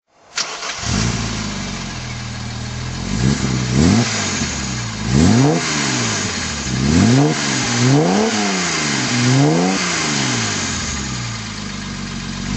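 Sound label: mercedes 280sl throttle, engine sound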